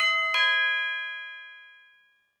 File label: chime